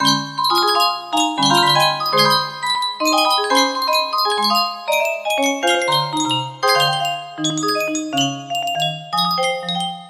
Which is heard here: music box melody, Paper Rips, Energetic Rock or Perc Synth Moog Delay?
music box melody